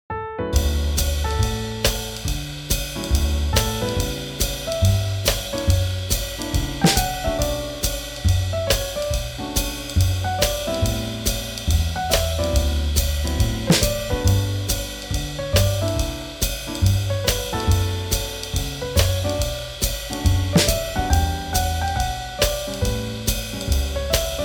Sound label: Swing Jazz